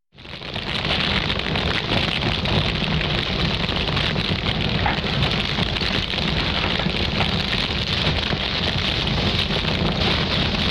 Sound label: Fire Burning; 1001 Sound Effects; Halloween Horror Sounds Effects